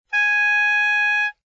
A sharp 3, soprano saxophone

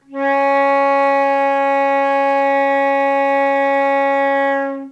C sharp 4, flute; modern

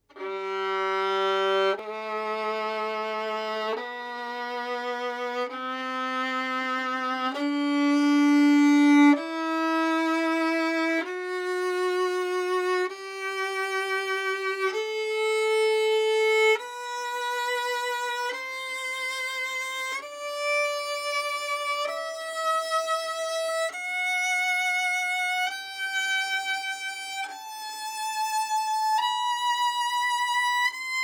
Scale

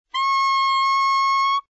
D sharp 7, soprano saxophone

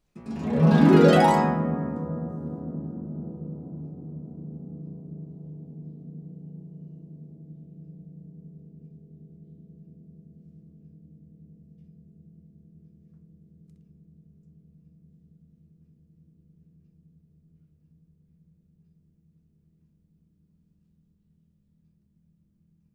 harp; hugh jones harp analysis

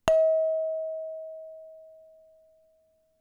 bellplate corner; bellplates

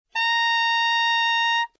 C7 alt, saxophone tenor

